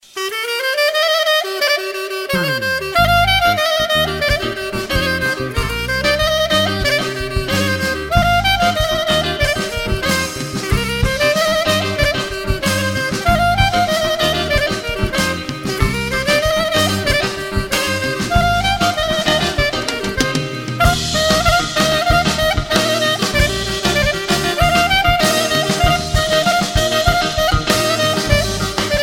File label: greek clarino